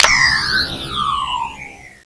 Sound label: mlaser out